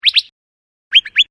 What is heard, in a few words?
Thrasher